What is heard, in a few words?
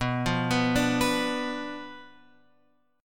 B Diminished B down Guitar Open F; Bdim arpeggiated